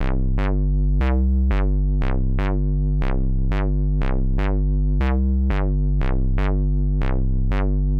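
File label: square bass C, Nu Jazz House, Bass Loops, 120 bpm; PowerFX - Nu Jazz House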